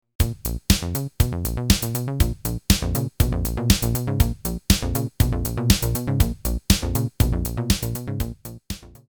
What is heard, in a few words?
delay